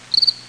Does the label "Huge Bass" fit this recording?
No